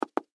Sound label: Food Pepper Shaker Set Down On Table; Household